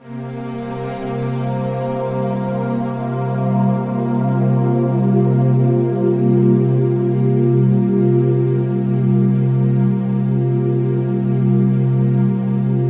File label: beauty, ethereal atmosphere